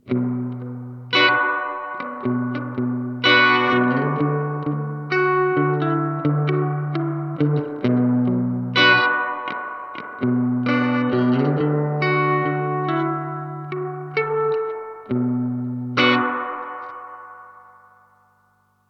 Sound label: Boss RE-202 Spring Reverb